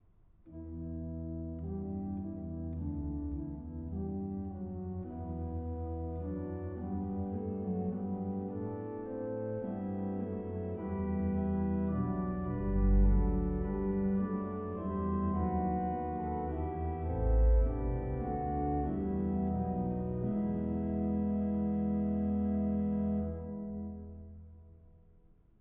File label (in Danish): forspil; Behold os Herre ved dit ord